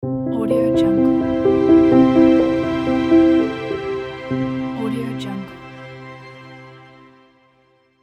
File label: soft piano and strings logo